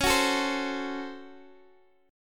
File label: Db Major 7th Suspended 4th Sharp 5th, Guitar Open G